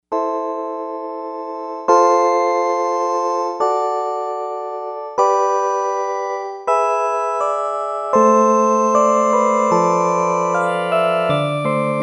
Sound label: Vibes Pad, Novation UltraNova; Factory Patches